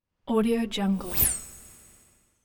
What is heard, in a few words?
high tone space ship whoosh; hollywoodedge